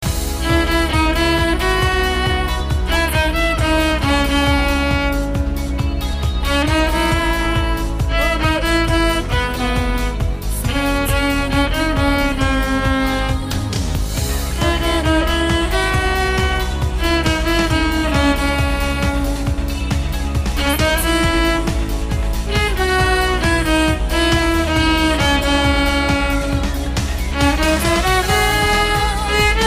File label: violin